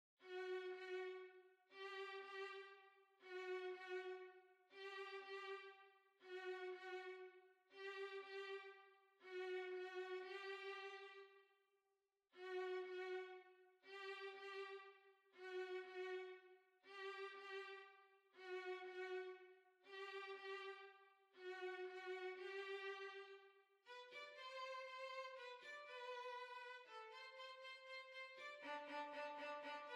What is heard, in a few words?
Waltz, Violin